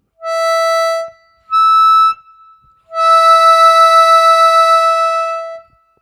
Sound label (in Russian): +5+8, октавы, губной гармошке; блокировки языком